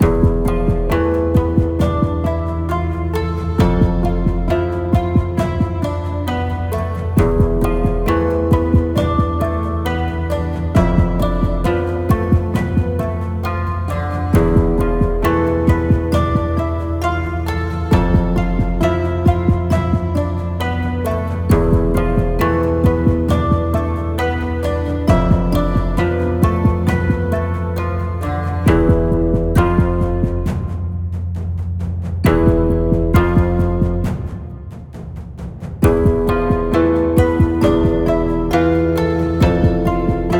Ambient Background Only Drums; Nyakuza Empress

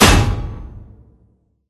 Juggernaut Drop

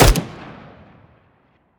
M4a1; Wepons M4a1 Us

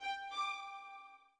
orbit violin; Sky Children of the Light gamerip

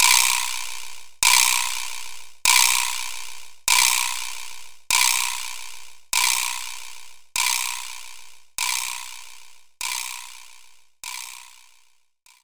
Vibra Slap; knights try

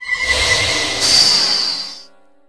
Ultimate spell

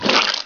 squirt

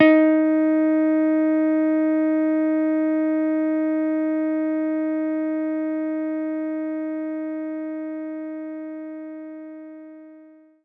Jazz Guitar D#4

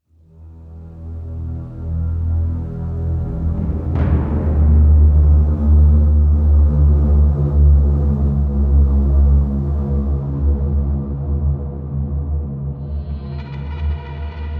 Mood Creepy; The Long Dark

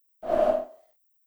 Page Left; Xbox 360 dashboard

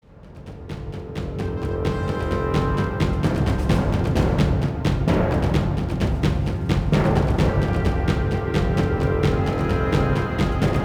US Ship Action; battlestations pacific

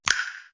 finger snap; mobile

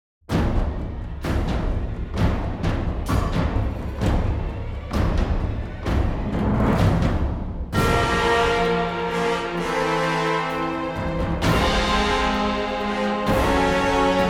Battle Theme; Dur Borba!

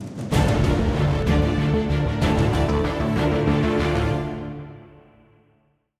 190bpm; Legends of Pegasus; windows gamerip 2012

